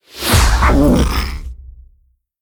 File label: Monster Spawn; rampage arcade gamerip 2018; Monster Ralph